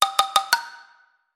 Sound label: Message sticks